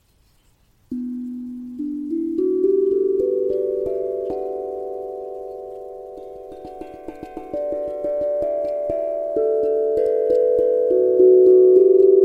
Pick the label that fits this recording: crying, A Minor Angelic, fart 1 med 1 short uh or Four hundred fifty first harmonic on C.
A Minor Angelic